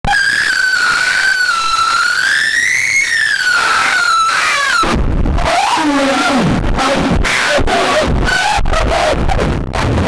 grrrr arf arf, dogs

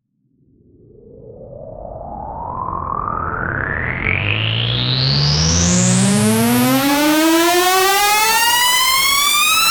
riser, ascending

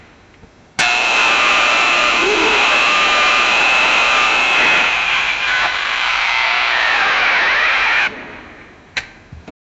electrical panel explosio

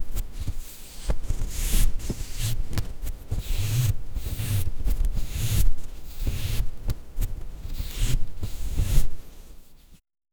stitch tshirt futuristic, design

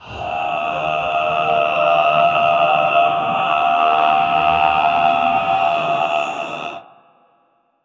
ultra realistic shamanic, occult

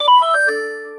tinder like notification; interface

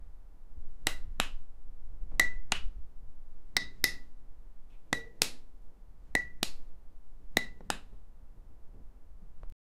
the gentle sound of test, glass

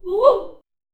people saying "ooh wow" bei; small room

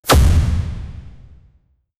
short soft missile launch, explosive tail

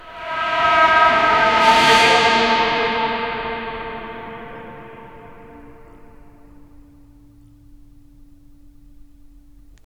a diamond rolls across a, indoor

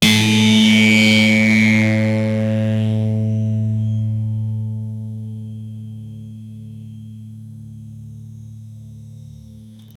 a massive pick scrape sli, string instrument

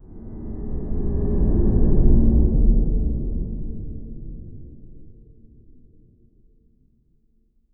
subtle horror sting short

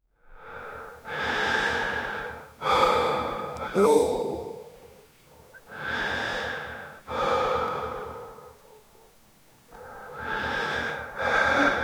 18 years old man holding, close mouth

